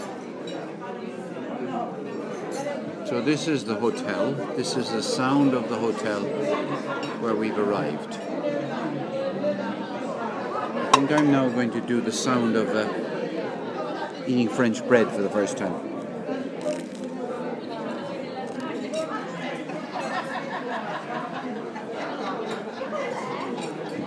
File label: hotel sounds baguette being eaten